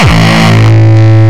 Longkick, kicks

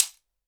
punch, handy percussion

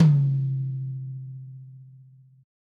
DW Red Maple Tom; Sonic Foundry (Sony Creative Software); Vital Drums

